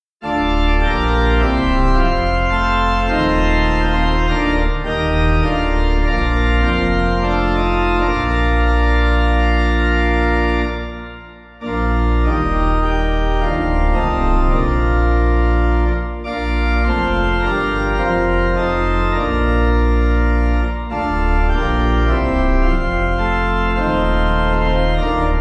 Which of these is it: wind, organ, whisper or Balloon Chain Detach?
organ